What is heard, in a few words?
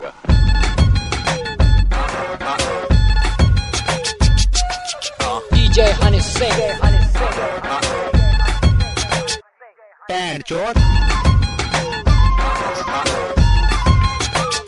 hip hop music